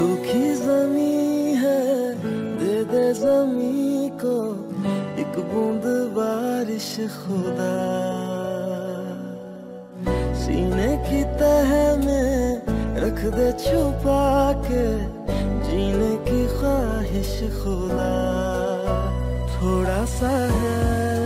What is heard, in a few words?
Romantic Hindi Song; ringtones